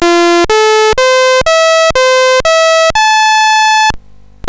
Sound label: BASIC sid, CSG8580R5; Balloonrace